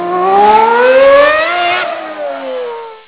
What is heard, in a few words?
motorcycle